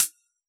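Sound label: Hi-Hat Closed, Yamaha DJX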